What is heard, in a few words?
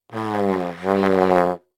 Cartoon Trombone Fart